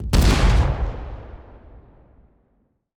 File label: canon fire; fallguys